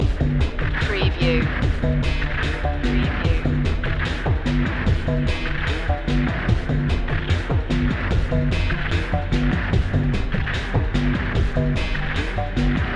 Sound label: gothic trailer hero loop